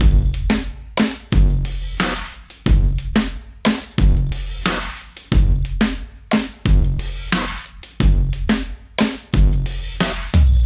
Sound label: Loop Jam 90bpm